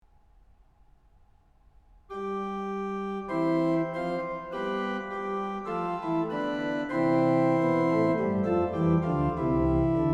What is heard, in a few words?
jeux d'orgues